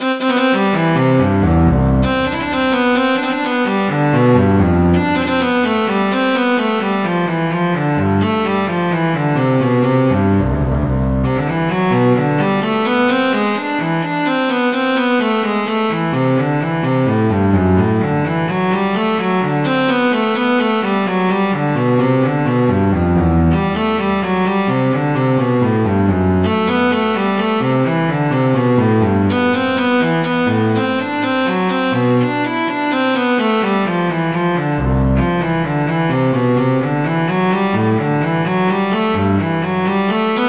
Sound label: cello